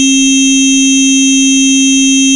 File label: EXTERNAL HORN, Aircraft A320